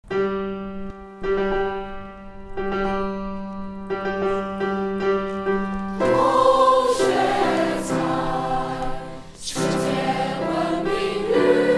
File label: School Hymn